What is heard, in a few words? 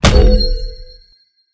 hit; smite; atlas